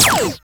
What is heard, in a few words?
fire small